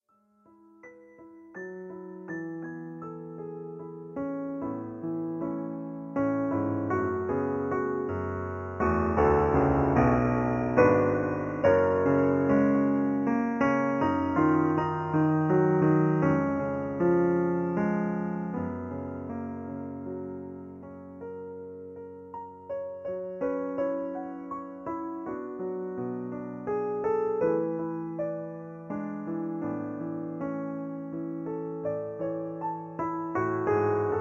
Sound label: Piano; Psalm 45